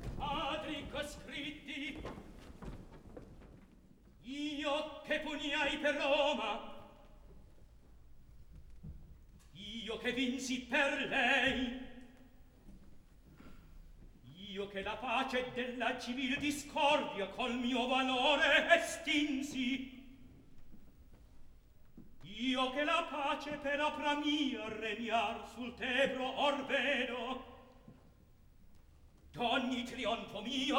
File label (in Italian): Atto Secondo Recitativo; Silla, Giunia, Aufidio; Mozart; Operas; KV 135 Lucio Silla - 1772